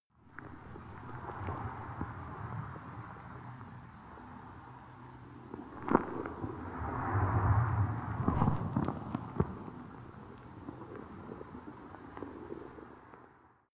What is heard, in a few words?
abstract beach